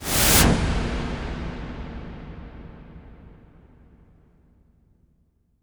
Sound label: IMP WIPE, Cinematic Impact